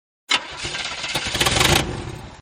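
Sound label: jalopy started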